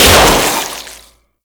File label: Balloon Pop; Festivities; Warframe; Follie